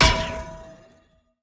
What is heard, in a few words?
goal miss bounce, Ball Collision Goal Frame